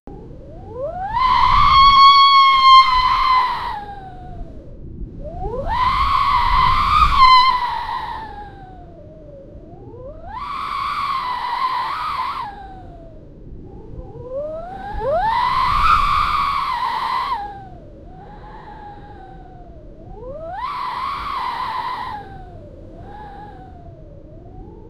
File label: the girl is screaming in, hurricane